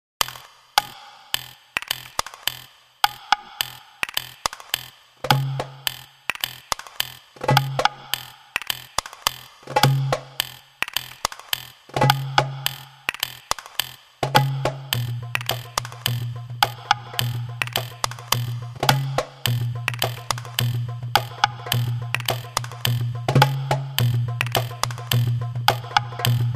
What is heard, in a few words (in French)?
PERCUS DES FAUVES